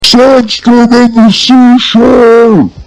SANDSTORM IN THE SEASHORE

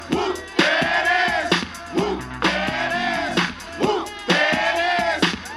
Whoop

